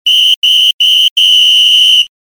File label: whistle end of game